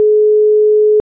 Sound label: Ring; Lumia 1320 Southeast Asia